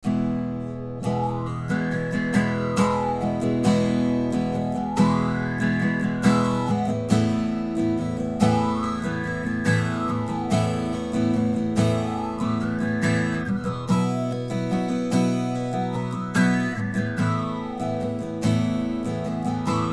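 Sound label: reverb phaser; audio examples from the book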